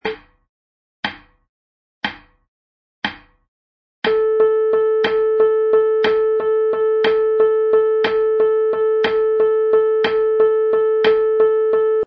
tresillos

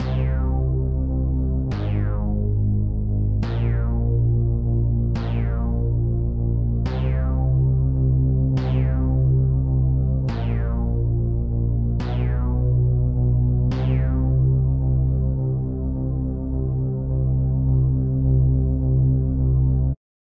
bajo

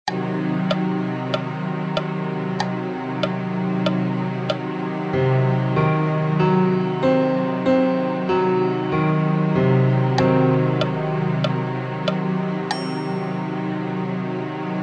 triada disminuida

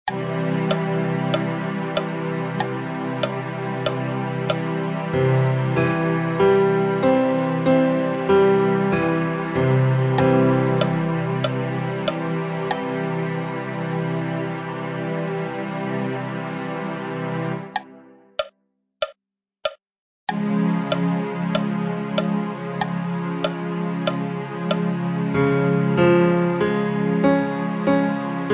triada mayor

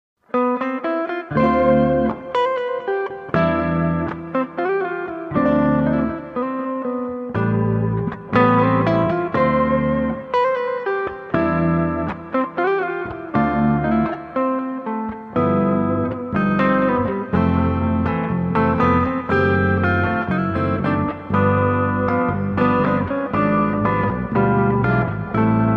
cheesy song con fade out

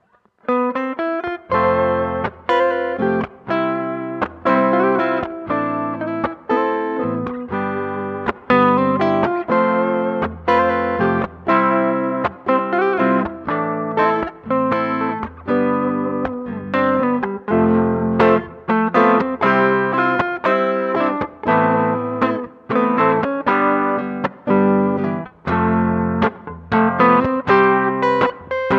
primeros acordes

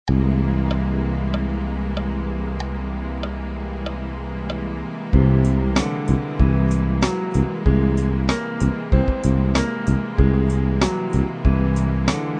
aumentada

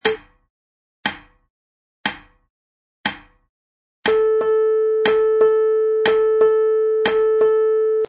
corchea negra

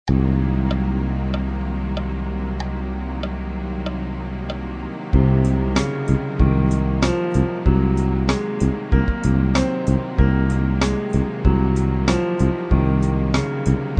locrio

